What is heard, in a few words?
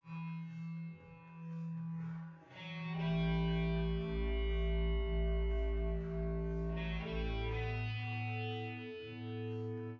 wdrums